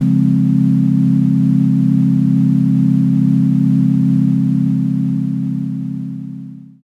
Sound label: Pad A Syn, Chords Tones; Equipped Music - Loungin House